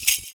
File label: FOOT PERC; Univers Sons - Raricussions